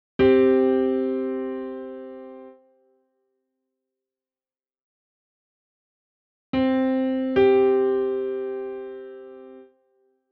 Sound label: interval